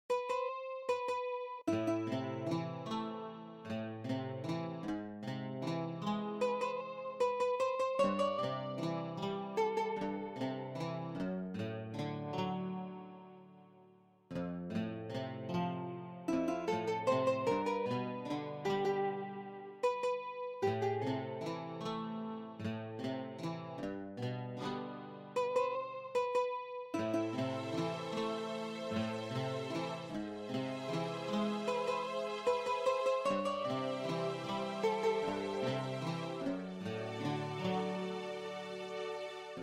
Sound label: MIDI Scene